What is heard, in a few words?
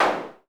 clap, Novation Bass Station 2